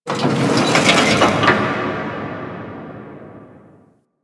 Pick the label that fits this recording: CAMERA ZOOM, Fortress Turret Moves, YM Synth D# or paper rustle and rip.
Fortress Turret Moves